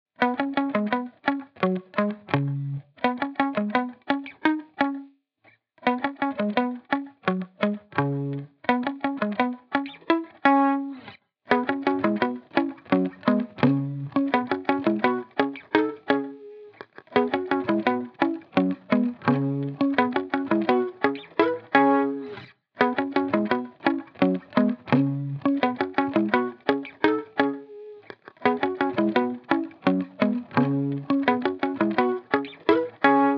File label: 170 BPM C# Min Lead Guitar; Loop Stems; Cymatics; Playground